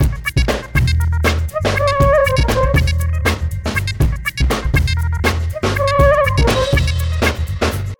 Beat Combo

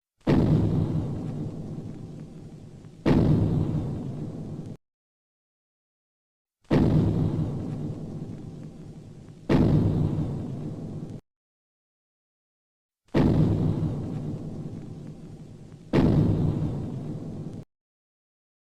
Giant stomp footsteps

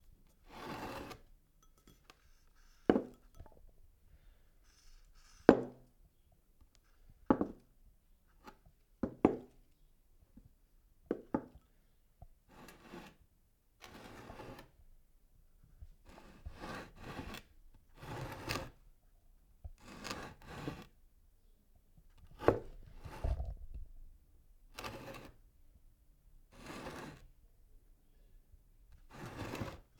Plant Pot

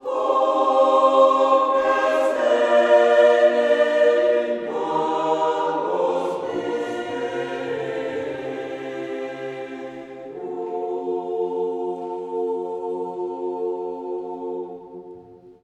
Choir Hoc Est Enim Corpus Meum

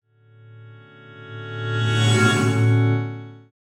Reverse Strum

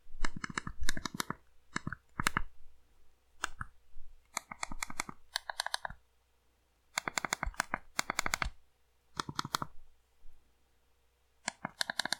Texting On An Old Flip Phone

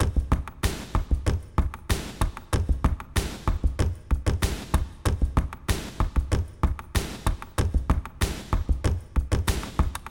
Drumloop 95 Wet

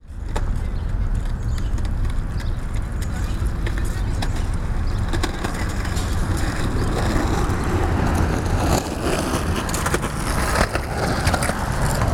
Skateboard

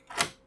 Door Unlocking

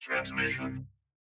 Transmission

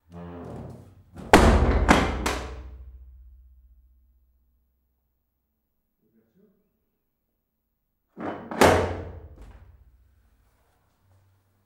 Locking Cell S Door

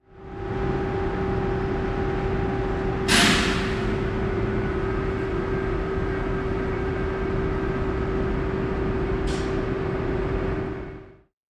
train airbrake

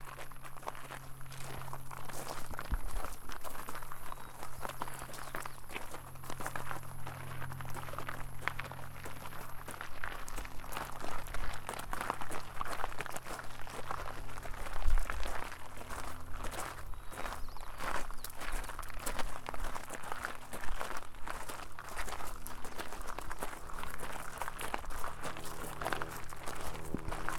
Walking gravel multiple people

